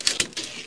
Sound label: open slid; house